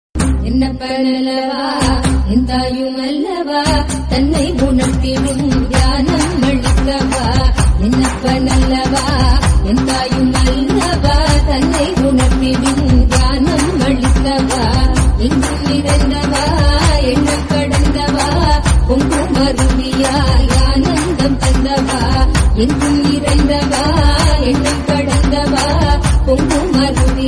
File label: Female Ringtone